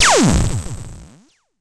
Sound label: Cwejman S1 - Good Zap